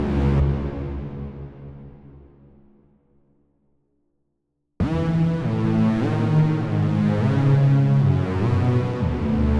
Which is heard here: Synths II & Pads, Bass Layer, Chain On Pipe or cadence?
Bass Layer